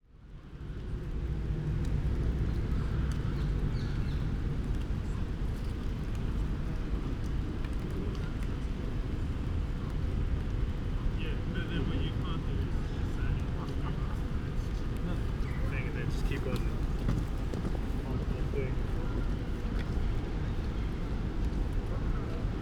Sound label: Quiet ambience people passing rain distant birds; microUši